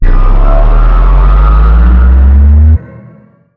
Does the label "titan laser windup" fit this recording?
Yes